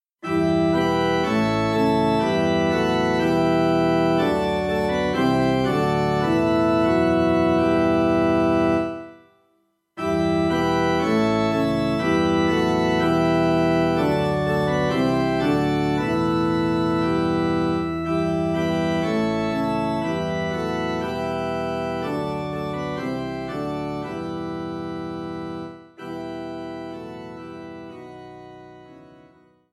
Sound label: accompaniment